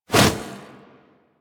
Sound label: Edge Fist Swipe; Warframe; Warframes; Pacifist